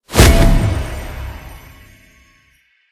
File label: medium win; cashnoire